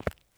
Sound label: FOOTSTEP Run Trainers Asphalt; adams venture origins